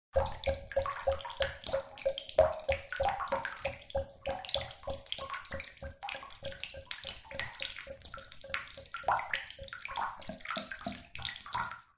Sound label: bath tap drip into water